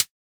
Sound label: Raptor Closed Hihat, Dubstep; Drums; One Shots; Cymbals